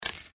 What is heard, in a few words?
dropping key; haus-garten